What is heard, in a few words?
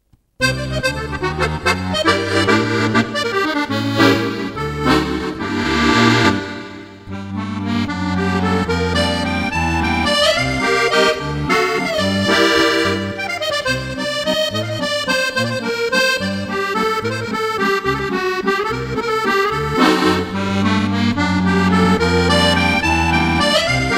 Swing valse, Fisarmonica; Corso di Fisarmonica; Giancarlo Caporilli